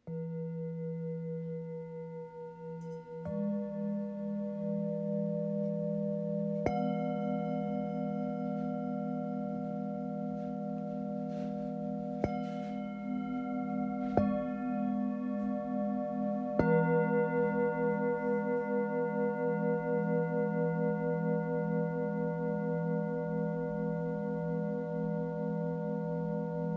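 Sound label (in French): Set, bols chantants tibétains; brillance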